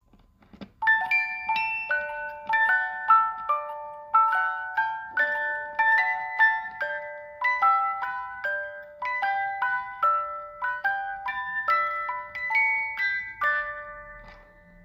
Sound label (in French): 18lames carmen, boite a musique; Boîte à musique en bois personnalisable